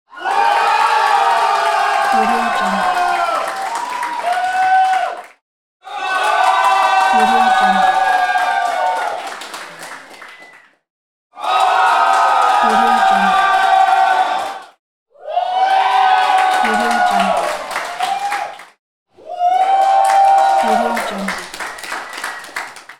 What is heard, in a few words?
audience cheers applause; sviridpavlov